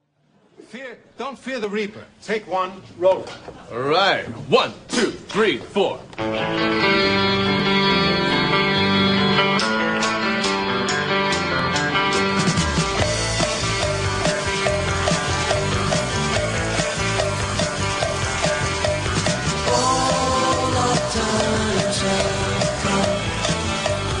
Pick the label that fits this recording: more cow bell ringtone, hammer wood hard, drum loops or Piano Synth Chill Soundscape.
more cow bell ringtone